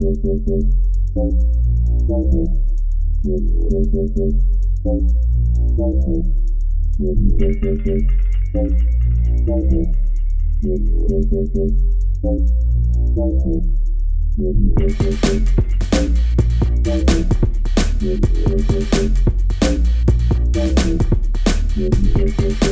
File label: Dive Break Song